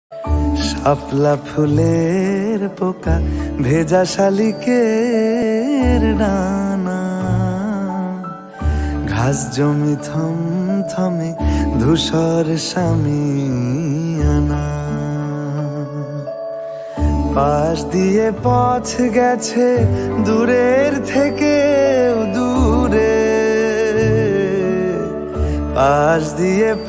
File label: bengali ringtone